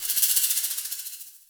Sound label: EQ Perc, Percussion Mix